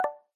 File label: camera click ringtone sms